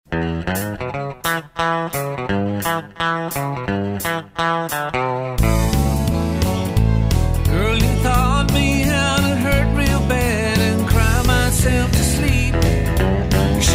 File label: guitars